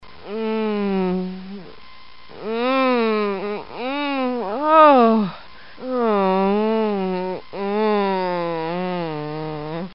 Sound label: woman moaning